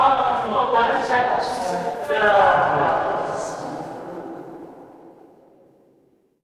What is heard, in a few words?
crowd murmur